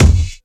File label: Misc Kik; Mo' Phatt; Digital Sound Factory Mo' Phatt